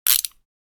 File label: Ratchet wrench turning fast twist